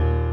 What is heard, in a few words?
piano low